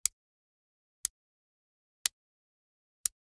Generic Click Glass Tiny

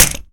Grenade Bounce; Weapons

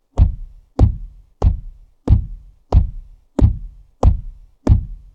monster steps fast loud; blindside

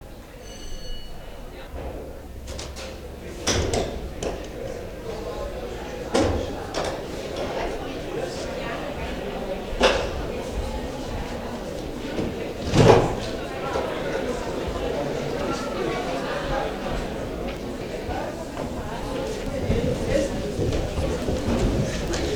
crowd enter room, crowds interior; best service Blue Box

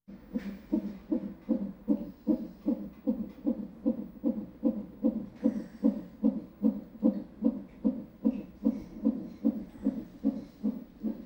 Hospital effects; Valentino Production Sound Effects Library CD50

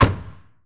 Viper GTS Door Close; Automobiles

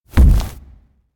Footstep From Monster Dinosaur; Hollywood Edge; sound designer's toolkit v2

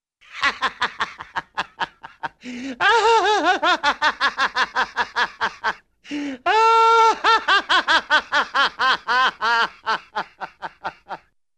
Man Laughs Hilariously, Cartoon; Hollywood Edge; Cartoon Trax

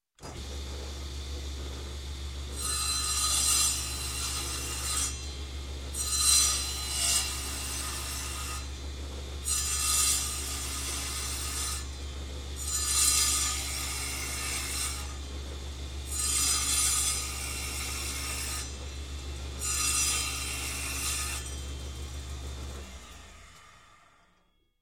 Construction, Saw Table Saw Start, Cut Wood; Sound Ideas 2000 Series

